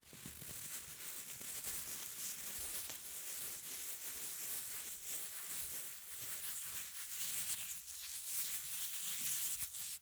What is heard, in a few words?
Grit, ultrafine, hardsurface, palms, smearing, spilling, moderate; Eiravaein Sound